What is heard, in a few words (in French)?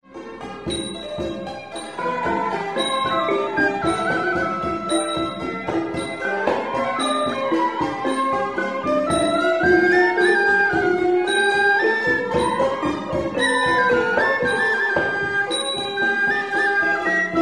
cambodge pnompenh 10 instruments concert